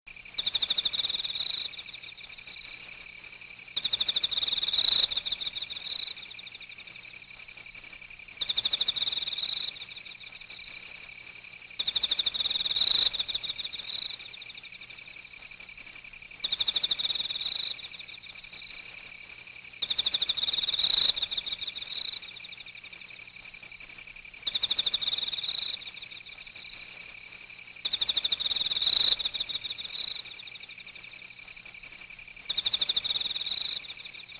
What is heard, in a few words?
Night Ambience (fall); Harvest Moon: Magical Melody